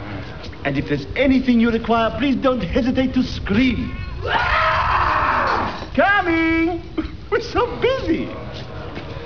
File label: scream; hood